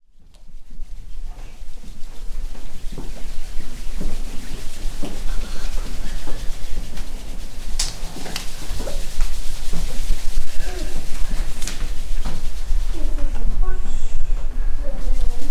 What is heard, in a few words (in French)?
la pluie bruitage